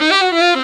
HIT, Hits & Brass

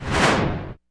cinematic impact wipe

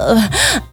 "OOOH HUH", VOCAL

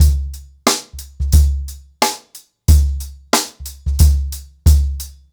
Ripple Effect 90BPM, acoustic drums

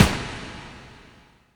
END, Tekno Industrial; East West

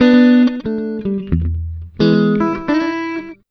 BLUES, Acoustic Guitar Loops; USB Soundscan vol.04; AKAI

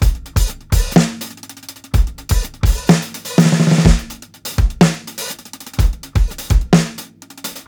125 ROLLS, DRUMS LOOPS; BASEMENT DRUMS VOL 2

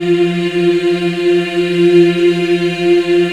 F3, Choir; USB Soundscan vol.28; Acoustic & Synth; AKAI

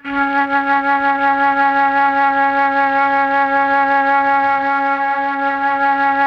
MELLOTROF; Masterbits - Vintage Voltage; MELLOTRON FL

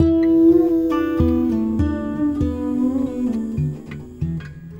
Guitar And Synth

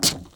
TWIST OFF, KITCHEN; AKAI S6000 CD-ROM - Volume 6; Daily Life

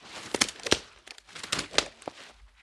Trudge Step, outdoor, Footsteps

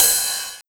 909 Ride; Drums

